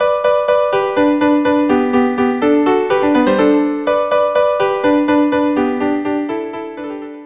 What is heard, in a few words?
viola duo; Rossini; 5 duos